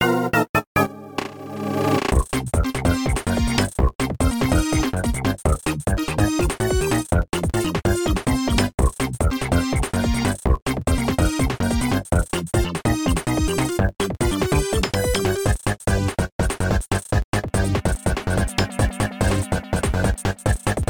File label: Music Fortress Boss; NSMB